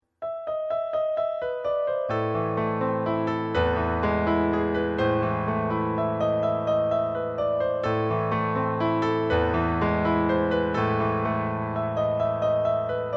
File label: piano